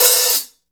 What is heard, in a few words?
HH PD, Drum 1 Dry; East Collexion